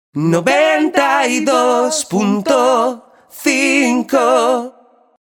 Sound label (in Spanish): dial cantado, dial cantando: noventa y dos punto cinco; cantante, locutor